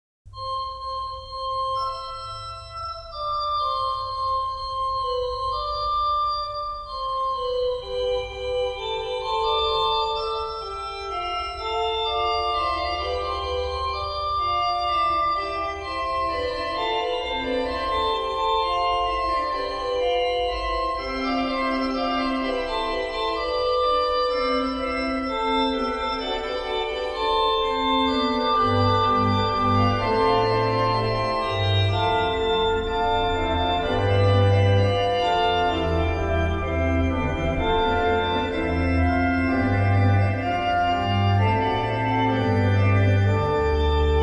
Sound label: Little Prelude and Fugue in C Major Fugue; Bach; BWV 553